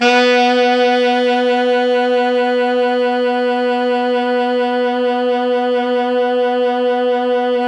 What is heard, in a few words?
Pop Brass, B2 -L; Best Service ProSamples vol.19; AKAI